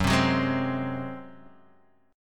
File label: Ab 7th Gb, Guitar